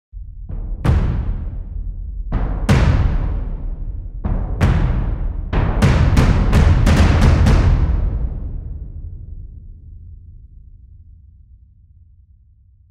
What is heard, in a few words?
Ensemble Designer Big Screen Ensembles